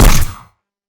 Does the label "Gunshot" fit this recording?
Yes